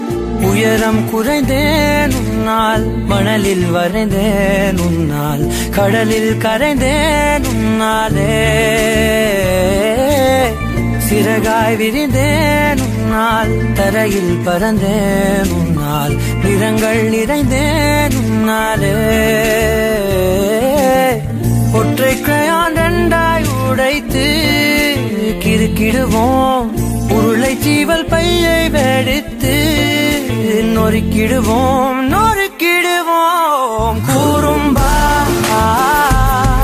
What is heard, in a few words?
Song Ringtone, Tamil; Tik Tik Tik